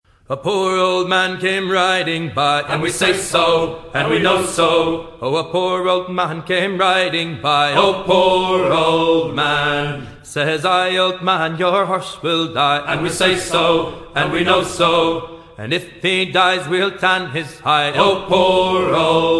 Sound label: dead horse shanty ringtone; assassins creed black flag